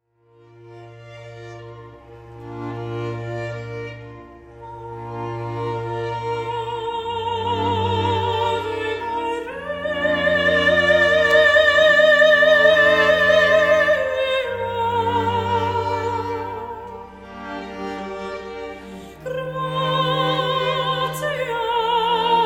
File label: String Quartet With Soprano